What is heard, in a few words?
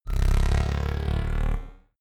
short sci fi sweep; whooshes rises; risers